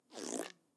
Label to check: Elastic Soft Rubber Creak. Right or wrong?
Right